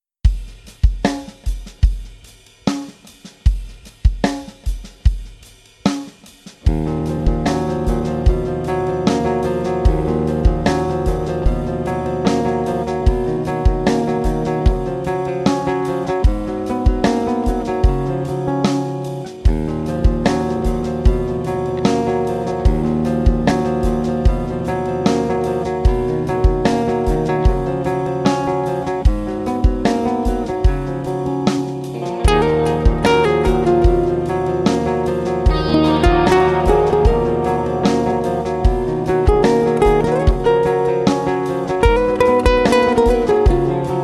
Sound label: fingers 432 Hz